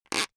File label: plastic pressured soft